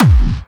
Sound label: Clubby Kicks, Bassdrums; Vengeance; Essential Clubsounds Vol.4